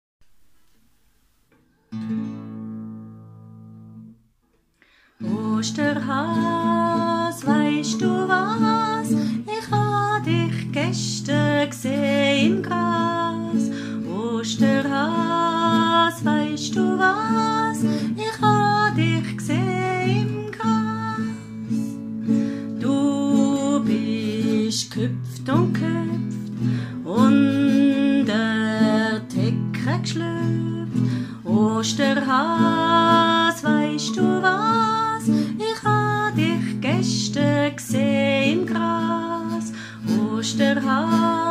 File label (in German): Lied Osterhas weisch du was; Waldkinder; Nature Flow